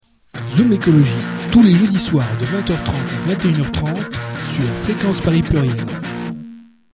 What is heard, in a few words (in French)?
jingle; zoomecologie